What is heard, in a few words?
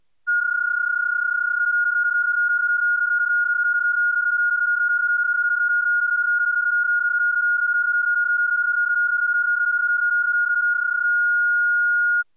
bpsk31